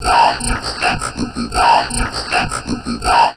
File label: VOCOD, Drum Loops Crazy Processed